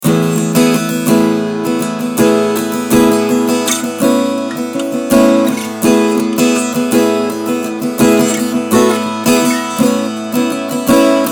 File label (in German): Strumming Mikrofon